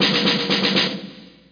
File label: Snare Phrase; 11,025 sample rate; SpecOps2MPlayerDemo; Music